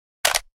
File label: mp5 clip out; weapons; mp5navy